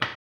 HAMBONE; ILIO; Vocal Planet; Blues & Soul

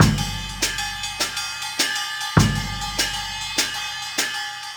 Cymbal and Kick

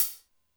Cym Dyno Edge, Drum Machines Alesis D4

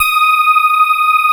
STRIA, Twisted Textures; STRYNGI

